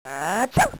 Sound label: achoo